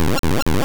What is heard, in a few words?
Super Fang